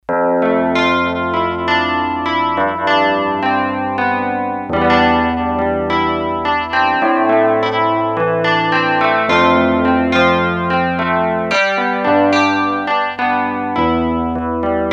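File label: 12 String Guitar, Korg DS-8; Factory Patches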